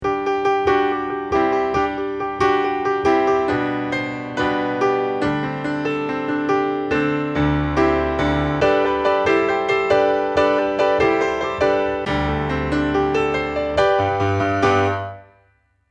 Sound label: accomp